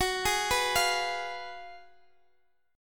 F sharp Major 7th Suspended 2nd Suspended 4th, Guitar, DADGAD; F#M7sus2sus4 arpeggiated; x,x,16,13,14,15; chord shape